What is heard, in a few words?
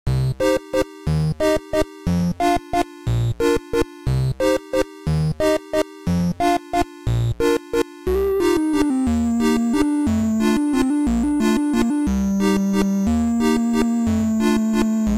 drifting off; tres bashers